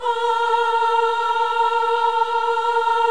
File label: VIB A#4, VIBRATO, Voices; Spectrasonics